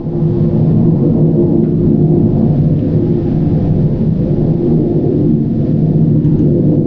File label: eurofighter